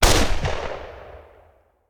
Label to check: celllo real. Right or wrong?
Wrong